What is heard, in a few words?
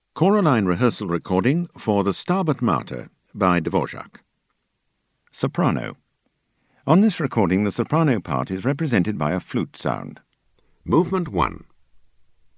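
Sop, soprano; Rehearsal; Dvorak SM; dvorak-stabat-mater; ChoraLine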